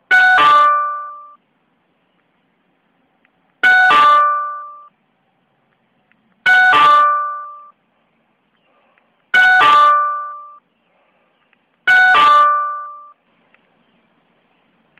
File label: Door Bell Ringer